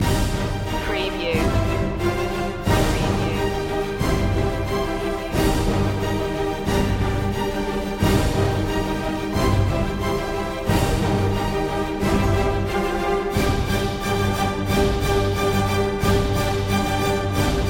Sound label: no choir